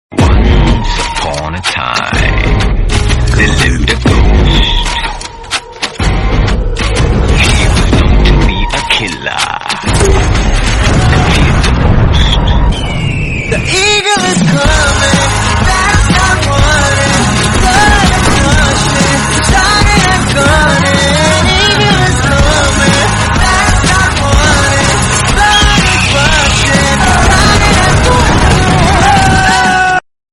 song music; vikram

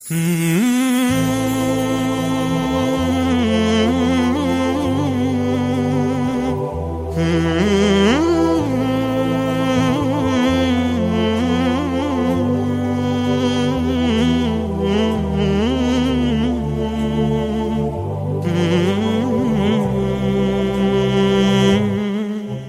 islamic trana

